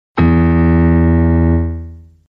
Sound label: Piano Notification